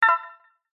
multimedia game sound high pitched warm soft positive